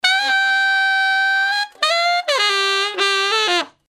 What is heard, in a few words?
brass loop